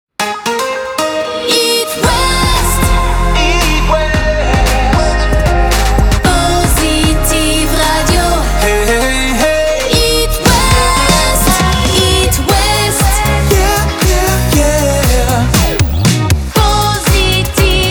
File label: Jingle Composite; Hit West